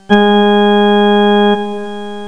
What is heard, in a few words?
organ, notes; ClickArt 300,000